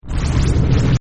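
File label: AMB LOOP, Sci Fi Atmospheres; Partners In Rhyme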